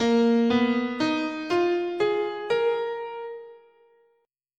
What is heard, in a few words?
Asharp Traditional Japanese "in sen"